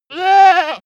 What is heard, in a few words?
goat baa